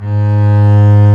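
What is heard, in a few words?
STRINGS, Cb Bowed f; Roland L-CD702; Cb2 f vb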